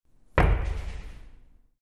Glass Door Knocks With Door Rattle And Reverb, Pounding On Door With Fist In Reverberant Hall; Glass Door Knocks With Door Rattle And Reverb #2-4: Pounding On Door With Fist In Reverberant Hall, X4; knocking